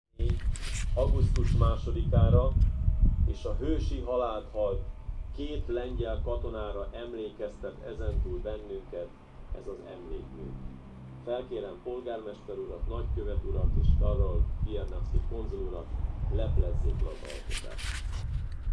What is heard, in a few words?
digitar